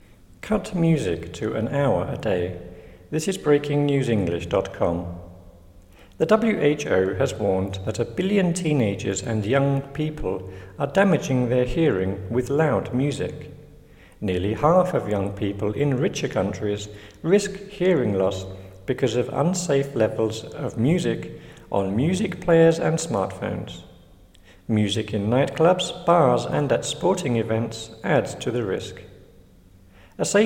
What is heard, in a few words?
loud music